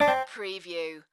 Casino Electronic Sound Two Tone

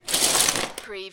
Lego, rummaging in lego box

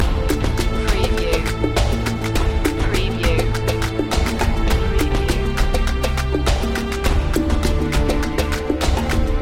loop

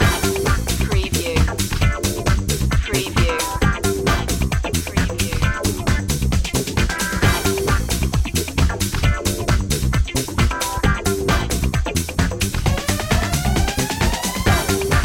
funky hammer

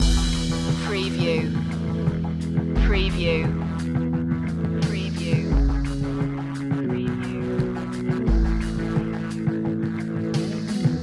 melancholic day loop

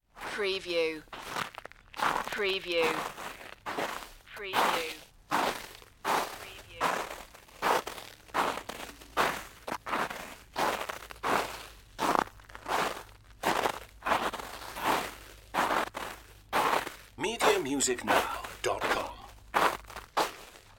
footsteps in icy snow

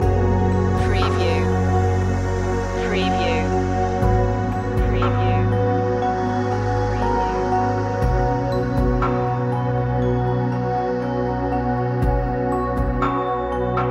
open universe loop

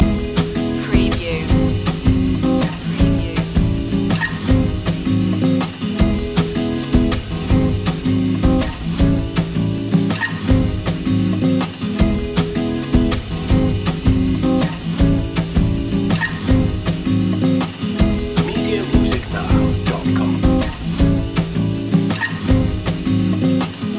vibe loop

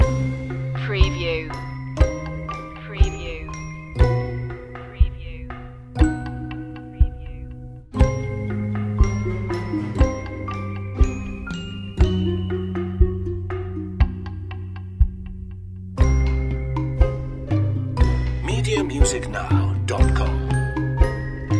ghostly mansion loop

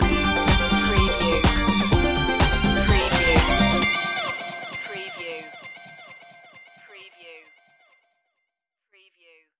disco loop

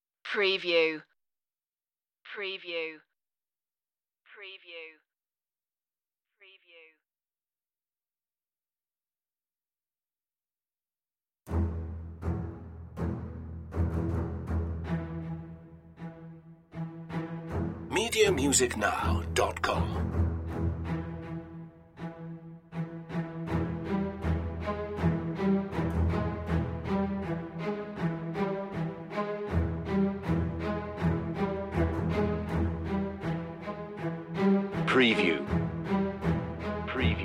savage future strings only

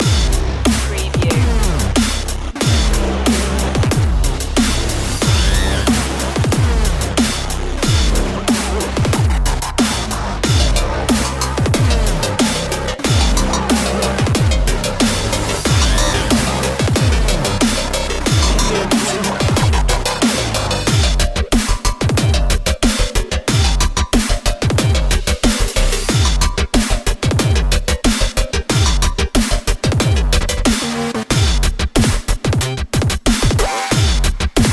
dubstep of frying cpu long looping

